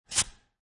Pneumatic Drill Unplugged, Short air release as drill is unplugged; Drills